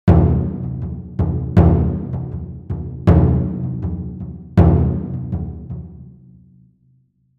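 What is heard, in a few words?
Raised 2 semitones, Ohdaiko; TAIKO THUNDER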